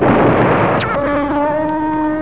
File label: demented fireworks